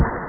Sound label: EXPL; stryker